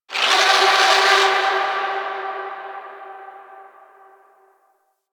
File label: Fortress Alarm Texture; Ambience; Warframe; Grineer Fortress